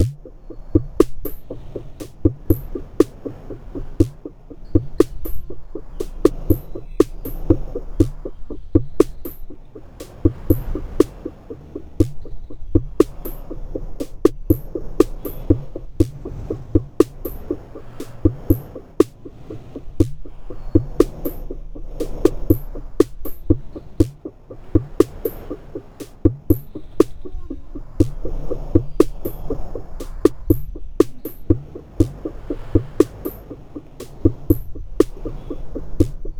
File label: Notturno Groove5